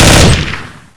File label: m16 burst; cs1 6 wep